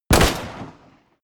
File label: pistol close shot